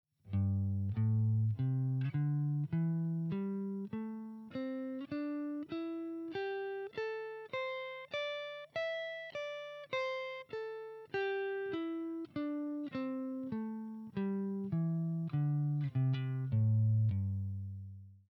Pentatonic Pattern Diagonal, guitar

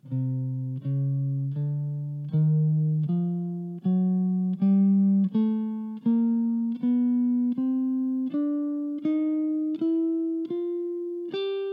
Dorian Chromatic

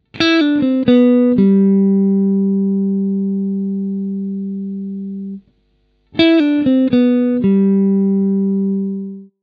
Major Scale Lick

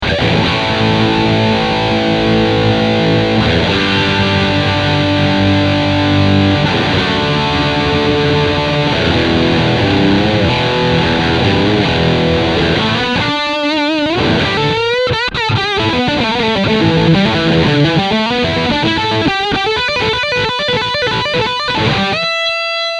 Rock Guitar

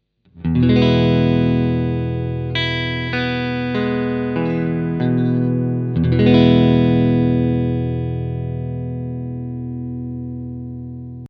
E Major Chord, guitar

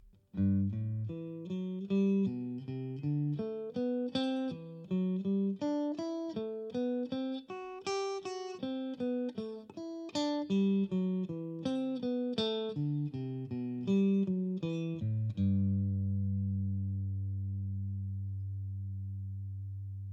Major Scale

